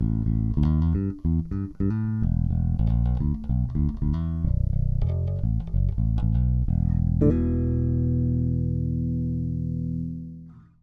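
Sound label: Thunderbird Active Middle, 5-String Pickup; Lollar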